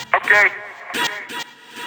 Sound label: House Loops, Vocal Loops House 128BPM